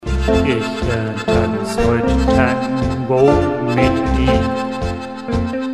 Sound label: tango